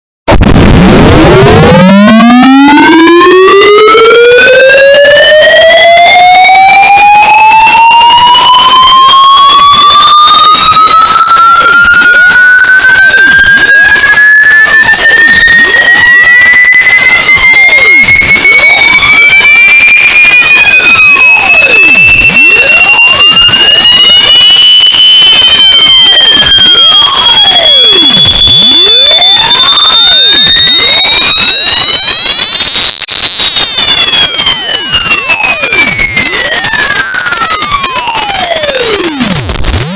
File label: EXTREMELY Painful Sound